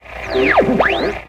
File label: ham radio tuning; minetest